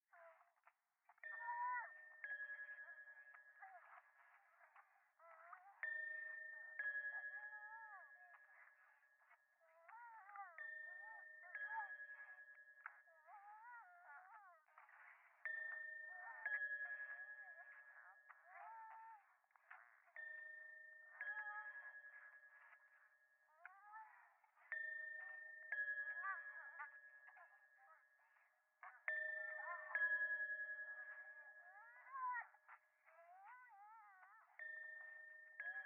電話 イラマチオ 寺

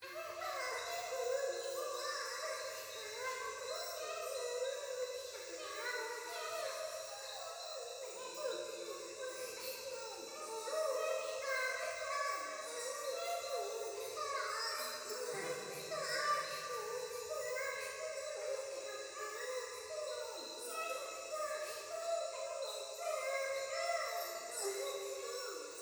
風呂 可愛い喘ぎ 山奥夜

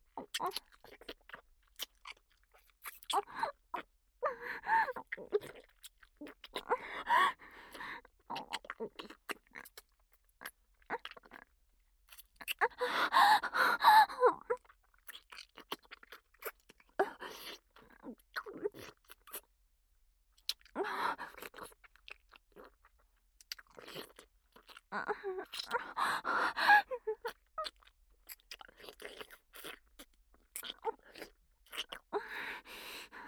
キス、喘ぎ, ASMR

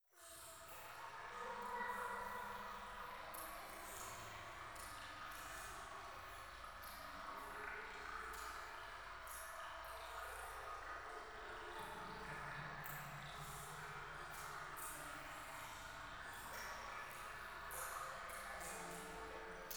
廃墟 イラマチオ 川のせせらぎ

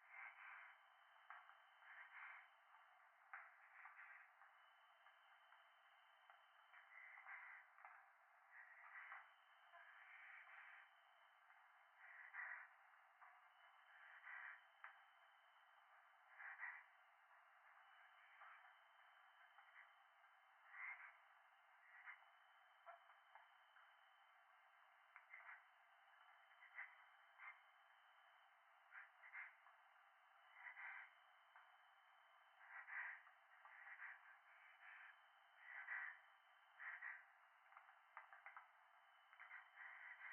風呂 左耳密着吐息 山奥

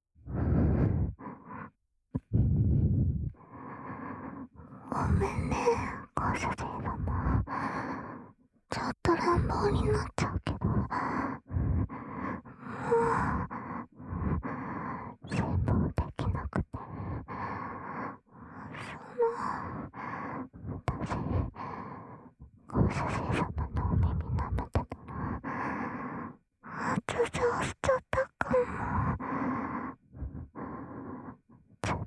発情おねだり耳舐めエッチ

